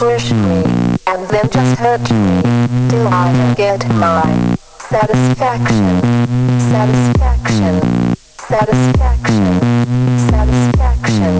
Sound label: female vocal